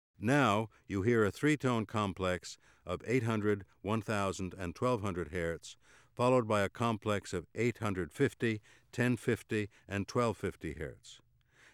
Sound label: Shift of virtual pitch, Auditory Demonstrations